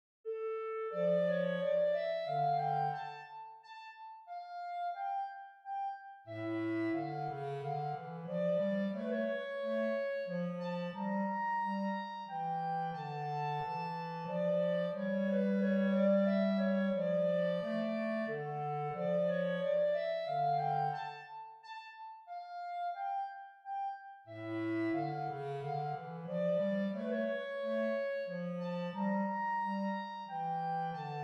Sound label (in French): menuet mineur; lully jean baptiste